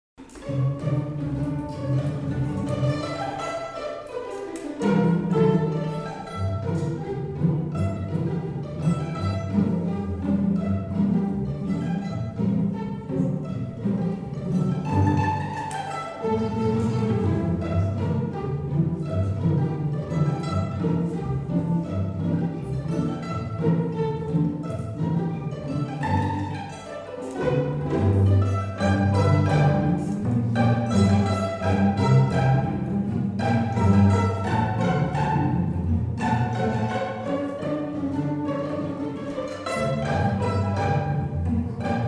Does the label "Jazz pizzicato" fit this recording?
Yes